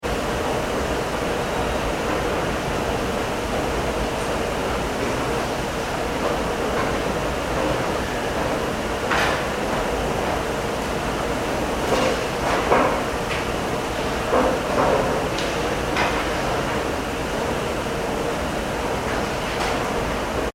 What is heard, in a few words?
ambience train station subway escalators; Train Station Escalator Internal Ambience - Constant Drone Of Large Escalators With Distan; Machines; Escalators Operating; McKinneySound